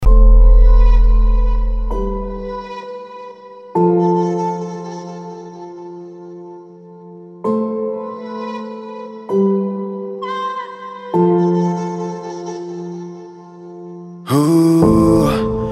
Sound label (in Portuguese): rap